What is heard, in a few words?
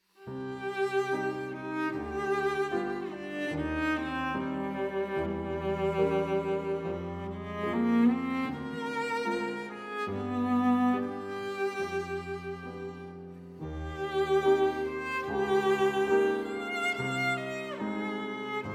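Cello and Piano, A Major; 24 Preludes for Cello and Piano, Op. 178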